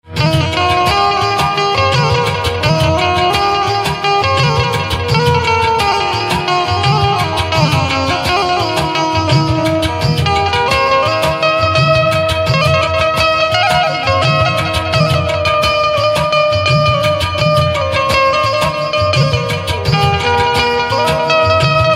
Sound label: Banjo Instrumental Ringtone